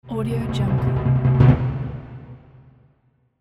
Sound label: short timpani roll